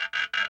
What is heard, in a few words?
Metal - Wind-up; Ed Edd n Eddy; Adromulus Pack